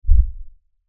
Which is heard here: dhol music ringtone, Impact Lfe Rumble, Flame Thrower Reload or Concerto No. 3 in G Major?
Impact Lfe Rumble